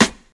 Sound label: crispy acoustic snare sound a sharp key; snare drum samples